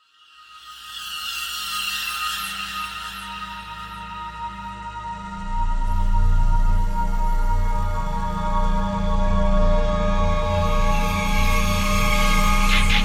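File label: instrumental low percussion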